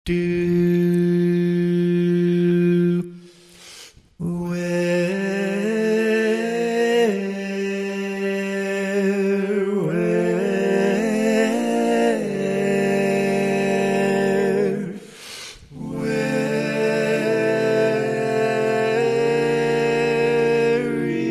Lead

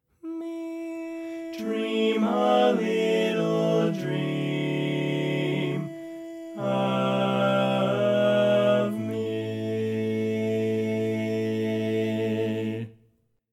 Bari, barbershop tags

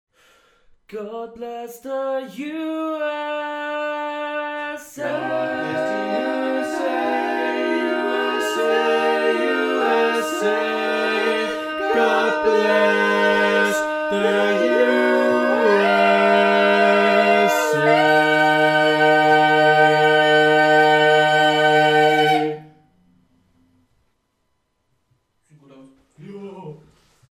All Parts, barbershop tag

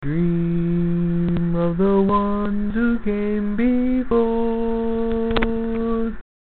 Lead

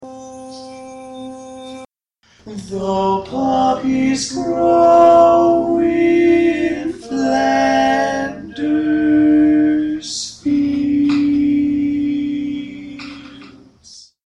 Tenor, Barbershop Tags

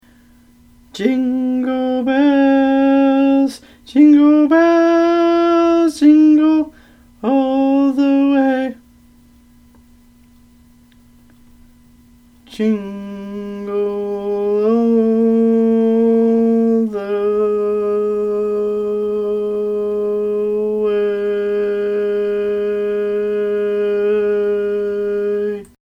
Lead, barbershop tags

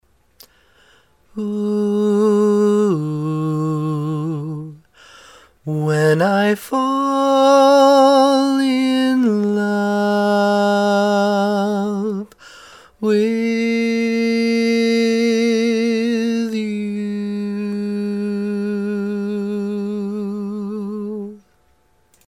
simple, Lead, barbershop tags

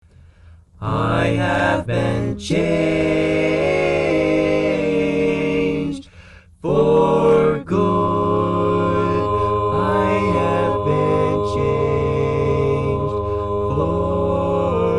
Tenor, barbershop tag

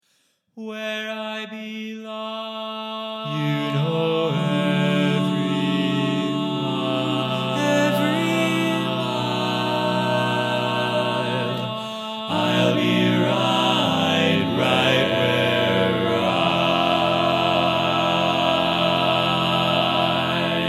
Low The Distance, All Parts, barbershop tags

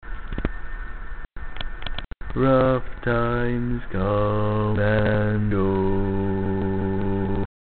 Bass, barbershop

